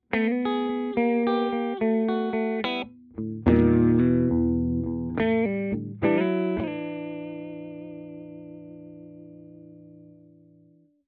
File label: clean middle blues gibson; vintage